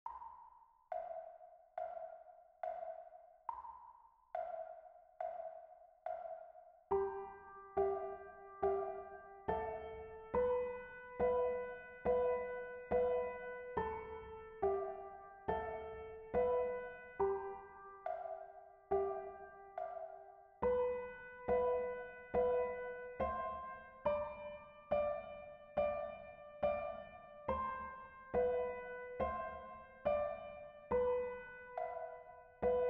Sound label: melody, gitarre guitar; Auf der Mauer auf der Lauer; Kinderlieder